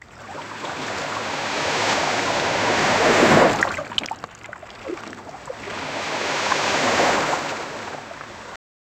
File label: the sound of waves crashing against rocks with a splash